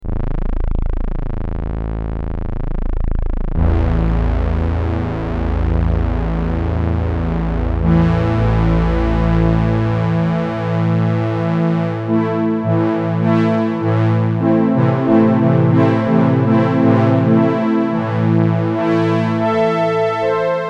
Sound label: Sunsyn SAW Strings